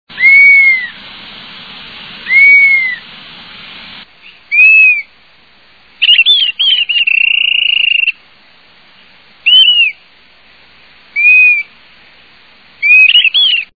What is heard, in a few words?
Dusky capped Flycatcher, songs